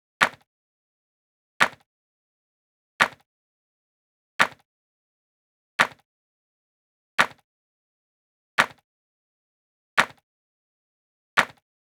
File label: Drum Loop - 86 BPM Clap; Loop Stems; Cymatics; DOPE DRUMS VOL. 1; Sky